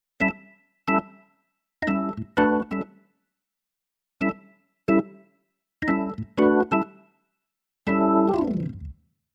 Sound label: keyboard organ blues organ